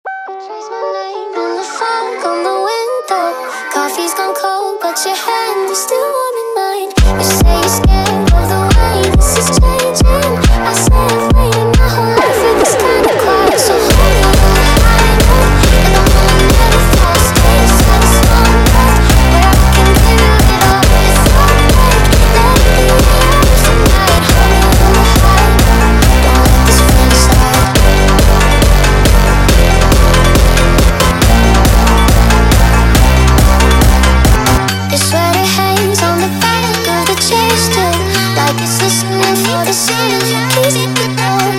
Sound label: High Note Jumpstyle sped Up